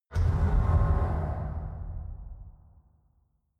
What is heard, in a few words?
deep metal impact, impacts; hits explosionen